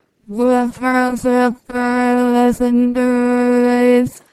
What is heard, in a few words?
singing